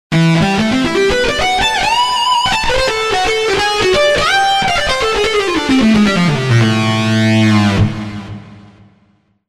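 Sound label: Dist Guitar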